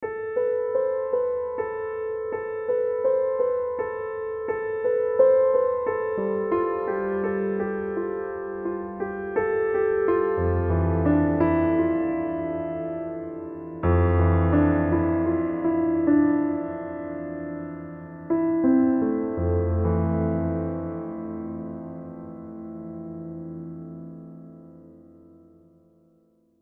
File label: Piano